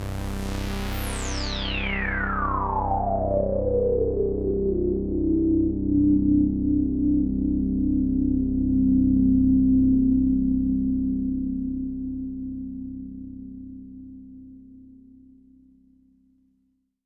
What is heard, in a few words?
Pad C, Synth Prophet One Shots; Electronisounds